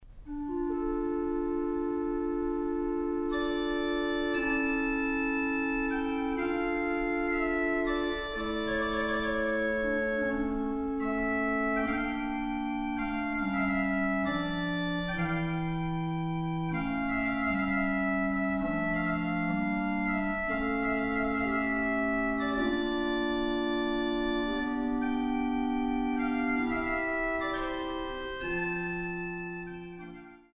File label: Recit, Orgel; Gellivare